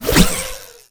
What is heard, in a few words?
grappler pull; cstrike; pubg